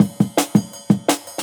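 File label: Jungle Breaks, 168 bpm; Original Jungle Breaks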